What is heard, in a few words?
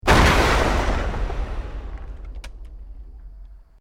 EXPLOSION MEDIUM WOOD